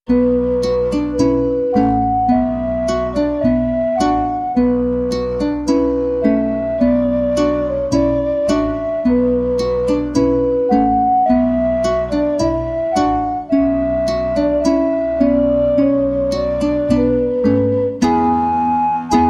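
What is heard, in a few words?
background music without words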